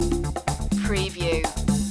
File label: disco loop